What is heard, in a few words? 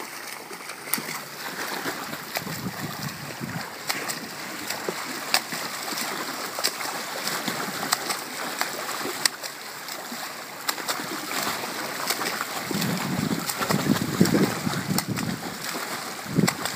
swimming; channelswimmer